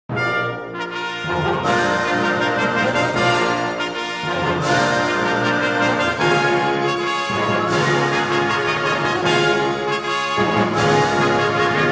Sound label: Chorale With Orchestra, Greater Works